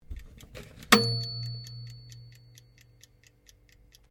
OVEN FANFORCE TIMER BELL, Common oven sounds; Appliances; Household

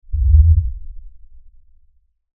Lfe Swell Low Bass Movement; Blastwave; Sound Design

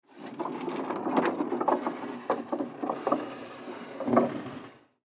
Sliding Door Wood, Sliding Door Wood Close; Sliding Doors; Household; Blastwave

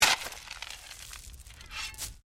Digging Shovel Stones; Various digging tools and processes; Construction; SoundEffects Foundation2

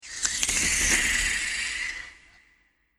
Monster Slurp And Slime; Monster Sound Effect

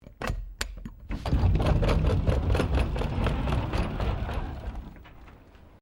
Door Sliding Exterior Glass Heavy Latch Open; Various doors

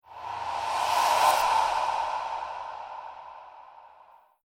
Transition Horror Whoosh Breathy Airy; Scifi Science Fiction; Whooshes

